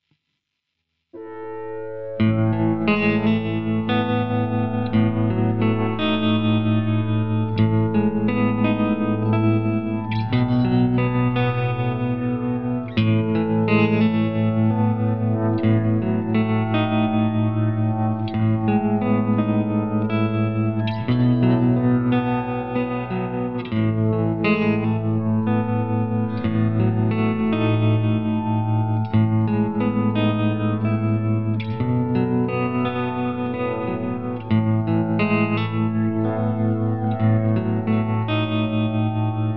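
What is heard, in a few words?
backing track pentalydian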